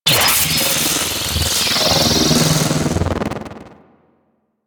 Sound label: Corpus Powerplant Lightning Hazard; Ambience; Warframe; Corpus Railjack; Tether Power Plant